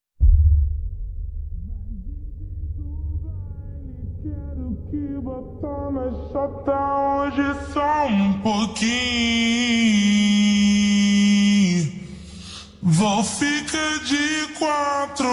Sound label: super slowed reverb